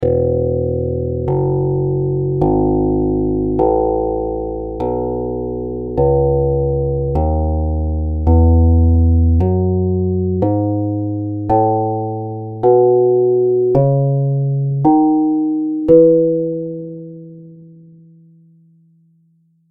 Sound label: bass